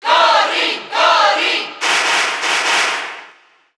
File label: Cheer Italian Spanish; Corrin; SSB4; SSBU